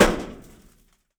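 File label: bullet metal; 3D Sound - 3D Keys VOL-1; MEAN 3D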